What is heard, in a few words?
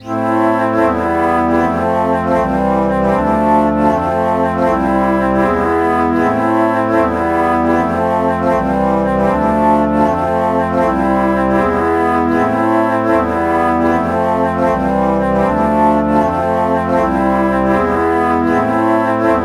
alto flute alto flute chord morph